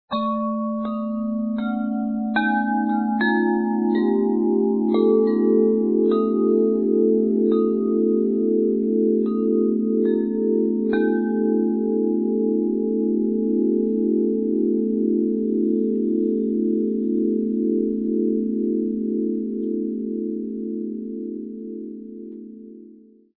Bass, windchime

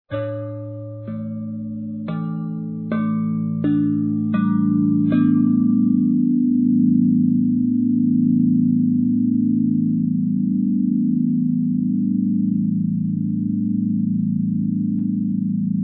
Bassoprofundo, windchime

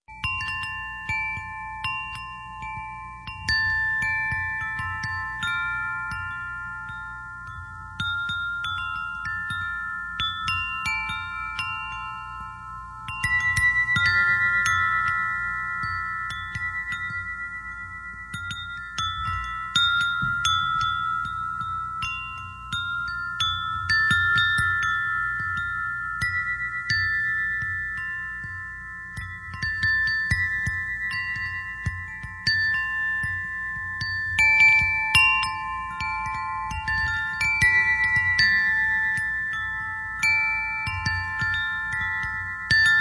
Treasure of Heaven Chime

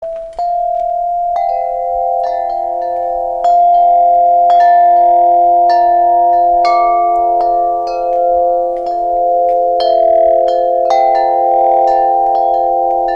Windchime

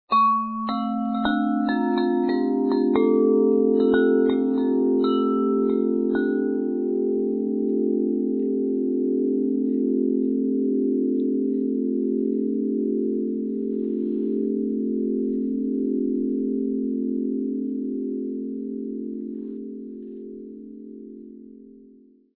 Bass, windchime

